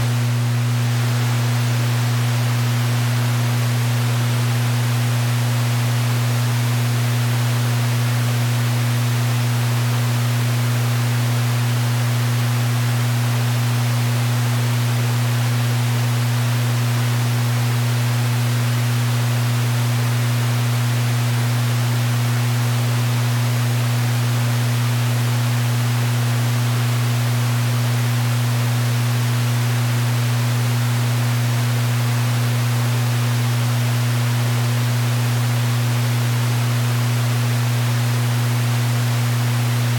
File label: roomtone milkbar